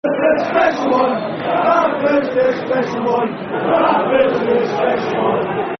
Rafa's The Special One, Football Chants (Soccer Songs), Liverpool